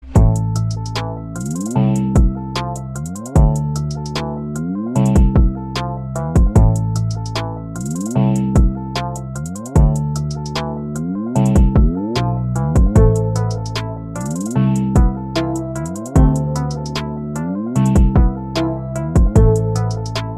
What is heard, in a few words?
TRAP, jingles